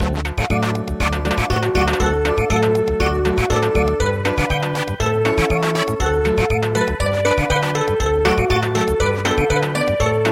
Samba

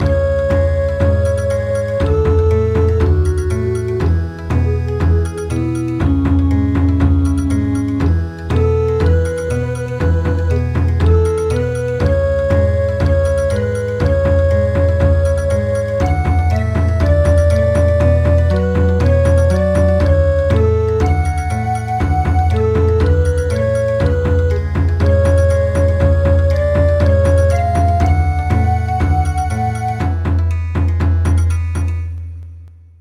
Bon Odori

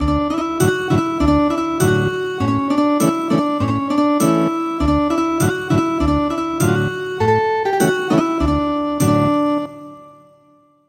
Ethnic Guitar

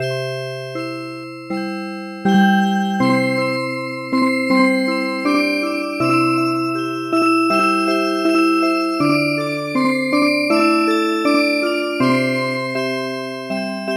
Music Box, midi

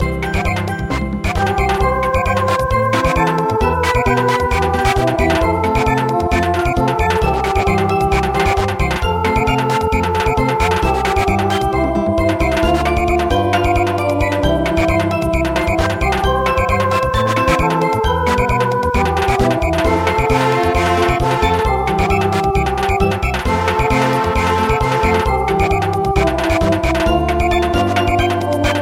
Samba; Ayelevi

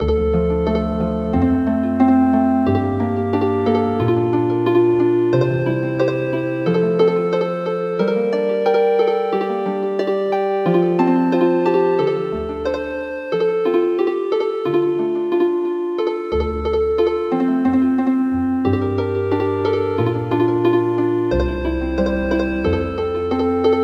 Healin Harp

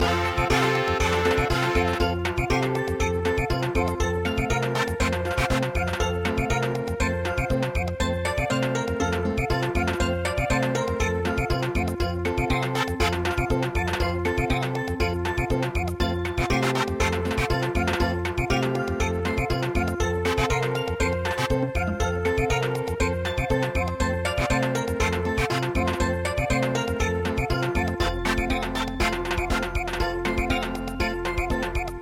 samba

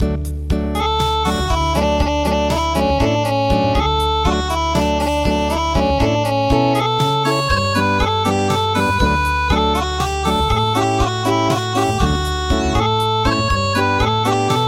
Acoustic

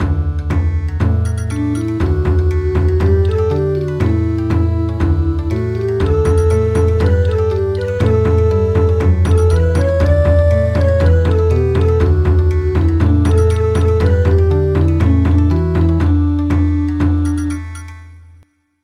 Bon Odori; Lullaby

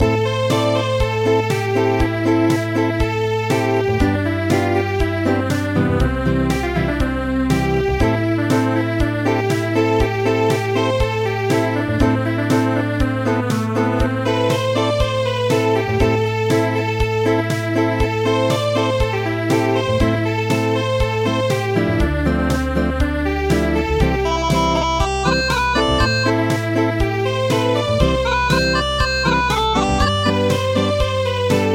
Acoustic; Arohnee

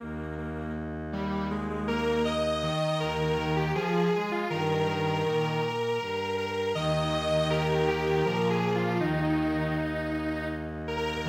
Strings Quartet; Der Lindenbaum

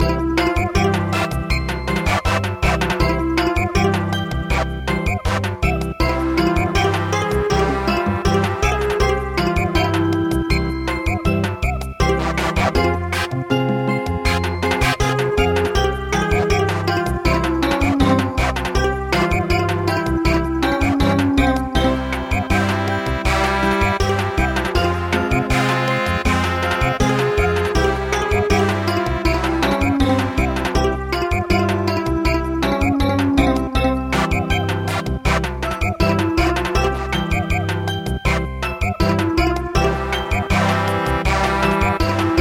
Samba; traditional; Oh Beautiful

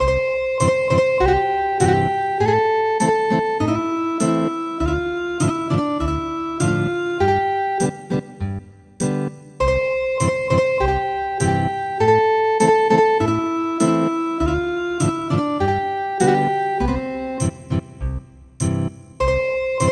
Ethnic Guitar; Bunny

